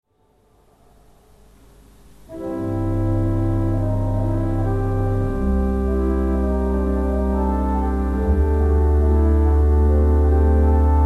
Dolce

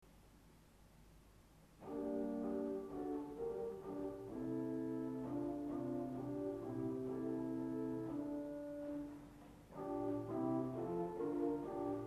Harmfloet 8 SW, Orgelstimmen

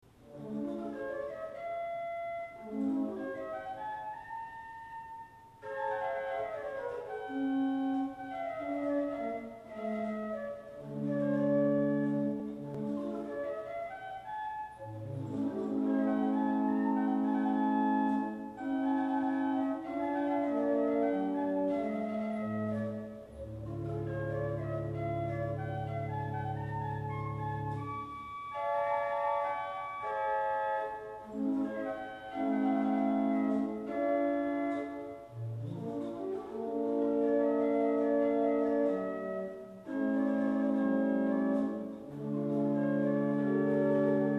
Hohlfloet 8, Orgelstimmen

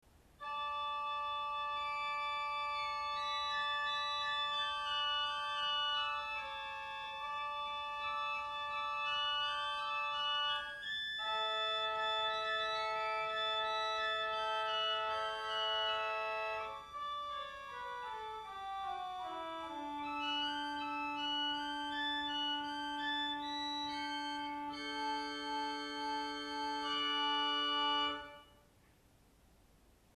Viola 4, Orgelstimmen

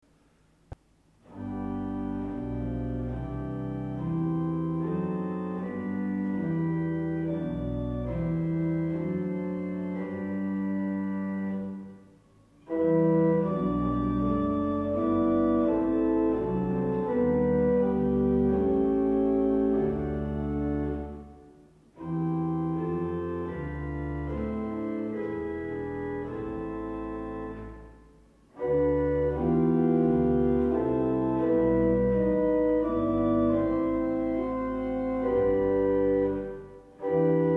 Streich 3, Orgelstimmen

